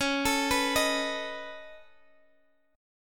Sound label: C sharp 7th Suspended 2nd Sharp 5th C sharp; arpeggiated; chord; x,x,11,14,12,11